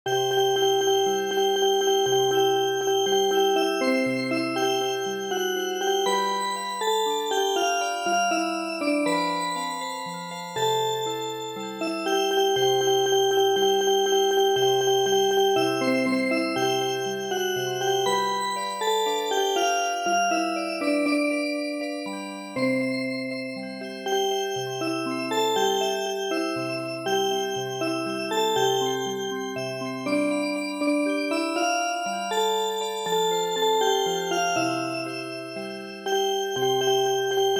Music Box, midi; Hail To Britannia

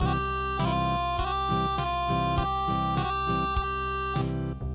Acoustic

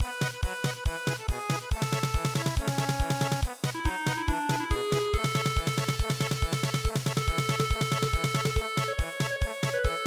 German Polka, traditional

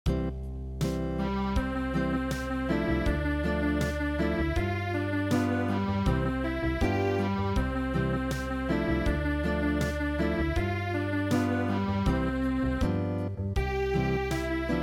Unplugged, midi

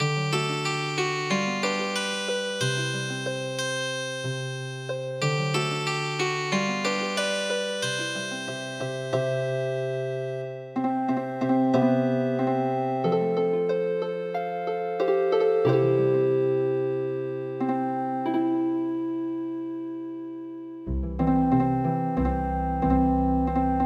Healing Harp, traditional

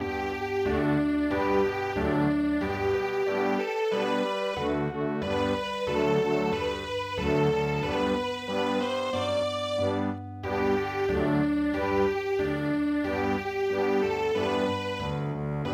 Vienna Waltz

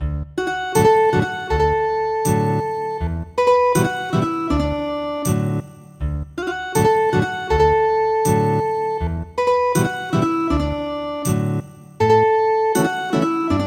Ethnic Guitar

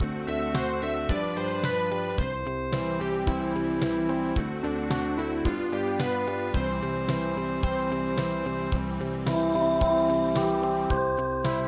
I ALI I NO OE Ballade

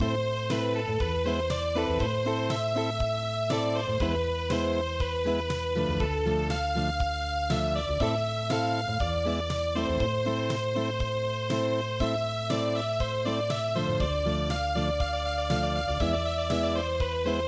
Unplugged; singing dusky thrush

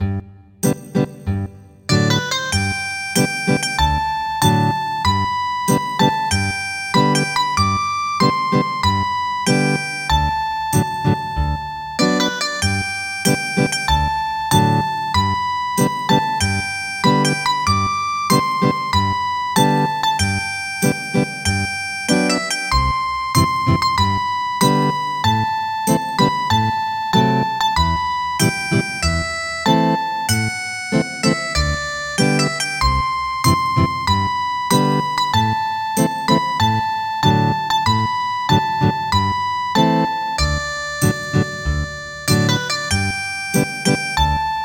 Ethnic Guitar, traditional; The Great Charlemagne

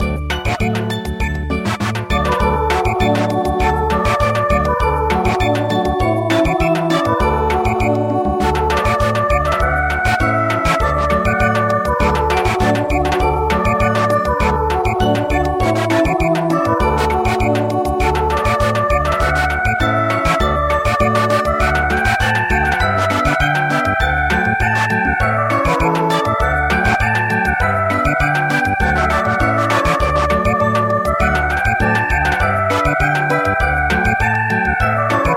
Samba

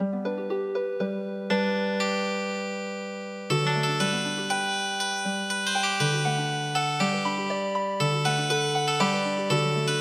Healing Harp

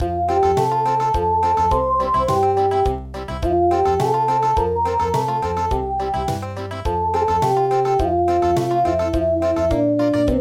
umi country

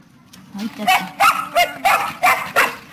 dog, soundscape

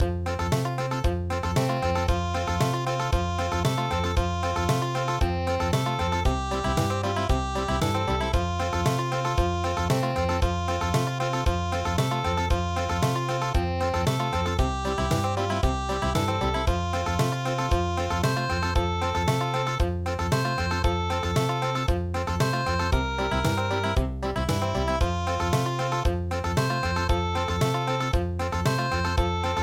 Country; forest camp

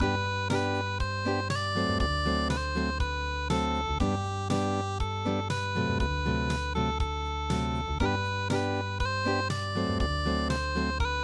unpluged; An Die Freude

